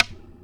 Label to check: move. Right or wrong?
Right